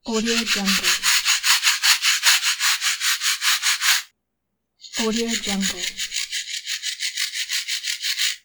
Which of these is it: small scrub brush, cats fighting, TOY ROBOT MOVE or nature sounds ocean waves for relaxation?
small scrub brush